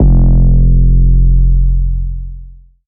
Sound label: 808 F; Cymatics